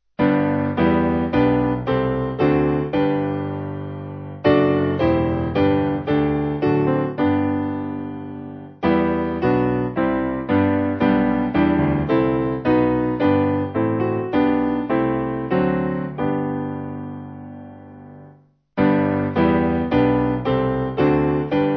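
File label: Piano, Music Backing Files for Churches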